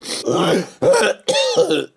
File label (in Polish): kaszlniecie